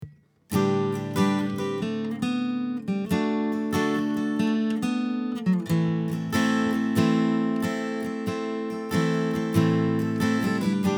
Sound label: guitar; Sennheiser Profile; sennheiser profile usb microphone